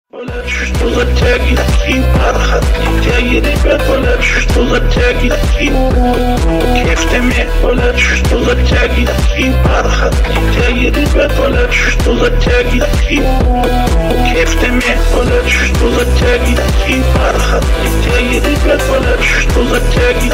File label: phonk house mashup